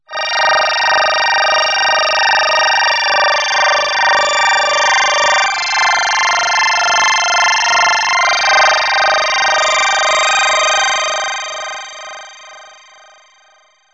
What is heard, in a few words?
synth trill, yamaha tx81Z